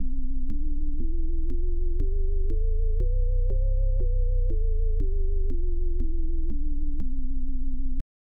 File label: c major scale bass leslie fast, hammond